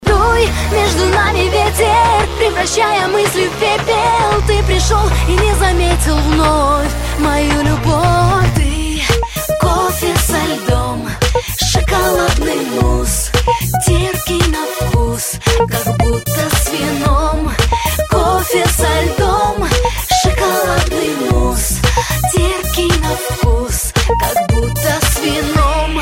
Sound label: Pop